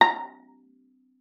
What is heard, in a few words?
A#5, VIOLA PIZZ; Xsample VOL-5; 90 s Sample CDs